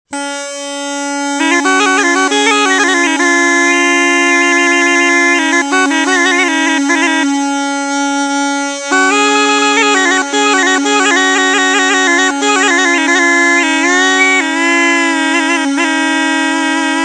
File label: zummara, musical instruments